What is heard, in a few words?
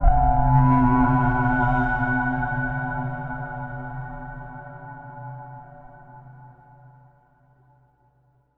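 monastery, temple bells; Spectrasonics; Bizarre Guitar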